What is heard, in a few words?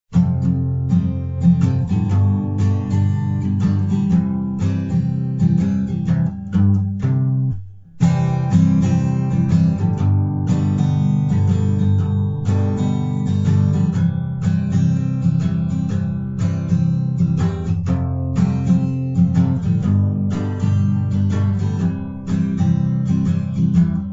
song no vocals; macedonian